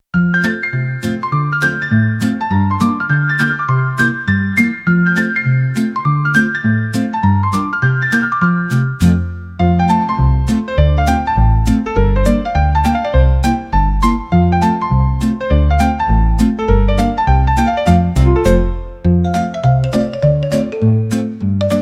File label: cartoon symphony, SlapstickComedy